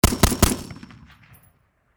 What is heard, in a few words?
Machine Gun Springfield M-14 Triple Shot Close Perspective; Warfare